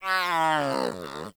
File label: Kitten Yawn; Warframe; Catbrow